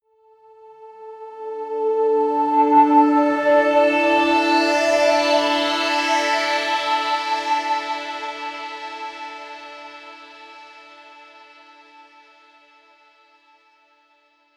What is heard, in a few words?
swell chord short, Pads; flaechen